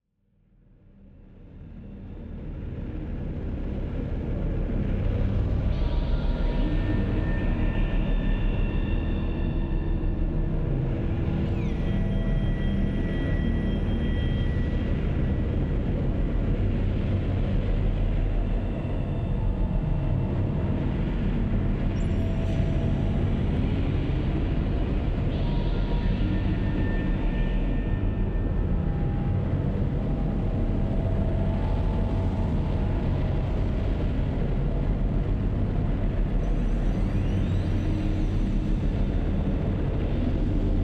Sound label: rumble and echo; Leslie Reid; STRANGE WORLDS PART 2